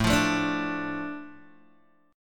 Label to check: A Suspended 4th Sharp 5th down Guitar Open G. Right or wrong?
Right